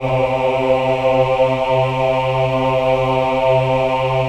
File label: VIB C3, VIBRATO, Voices; Spectrasonics